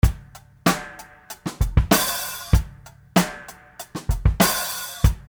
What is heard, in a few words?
drum; ecrins; Mars